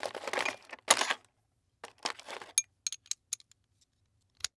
Industry Tool Wrench Socket Wrench Pulled From Tool Box Socket Put On